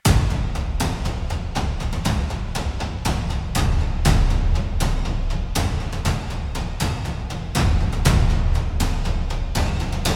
Thunder on the Pier, cinematic japanese style battles